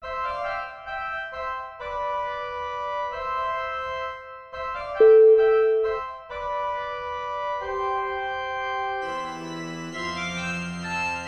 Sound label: pipe organ coda